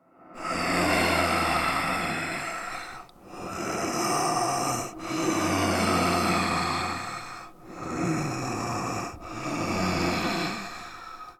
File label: breath; brainrot; italian brainrot 3; nihilanth